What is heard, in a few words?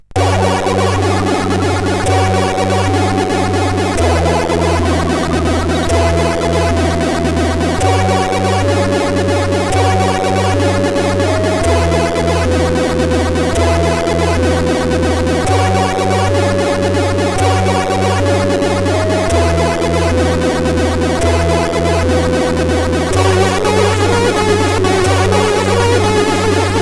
Crack Intro, sid CSG8580R5